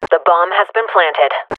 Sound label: bomb planted; announcer; cs2 radio f